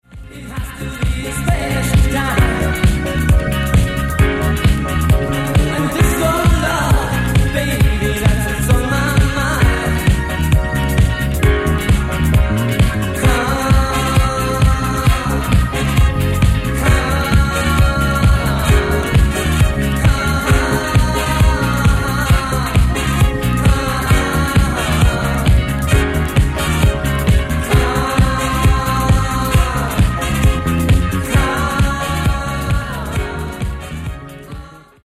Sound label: Drum Band Disco Style